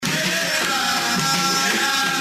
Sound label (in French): rythme musical